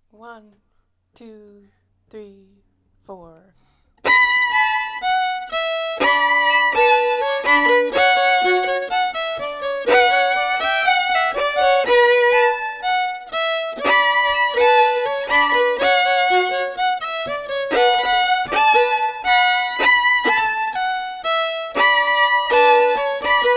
breakdown melody plus harmony, fiddle

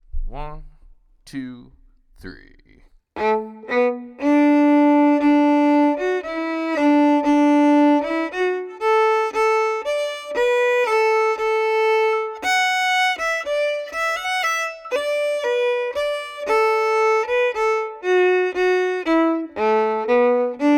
pete s march slow, fiddle

